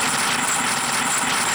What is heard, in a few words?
grind; Matt Hoffman's Pro BMX 2; XBOX